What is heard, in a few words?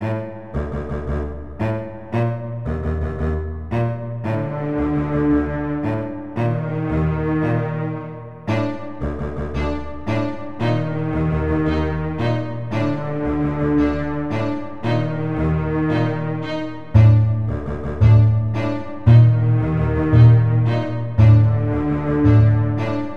Military Background Rhythm